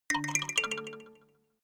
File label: notification kalimba delay triad up major